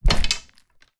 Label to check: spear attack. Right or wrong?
Right